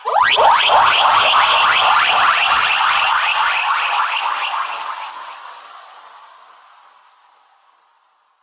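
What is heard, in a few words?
fx lazer reverse; M8; M8JUNGLEPAK1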